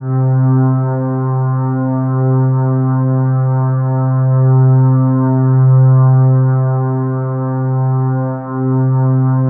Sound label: unison pad, MS-20